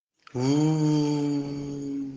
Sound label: uuuuh